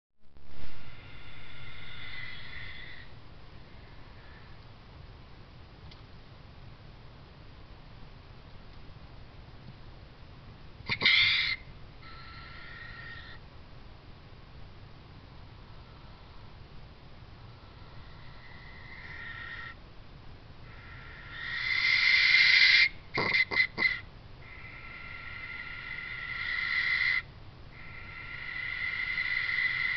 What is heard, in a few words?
Possum Fighting